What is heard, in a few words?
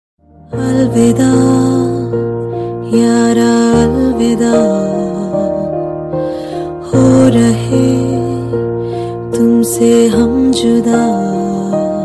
female ringtone